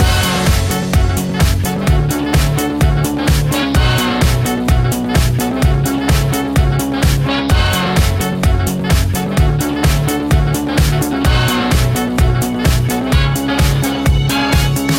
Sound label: Fortress Reel Loop; wow weakauras